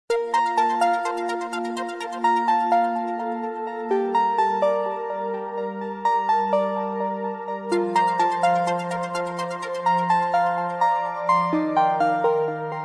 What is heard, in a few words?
piano vibes ringtone